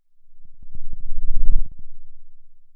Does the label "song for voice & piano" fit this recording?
No